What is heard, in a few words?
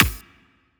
clap; Dubstep; Dubstep Sample Pack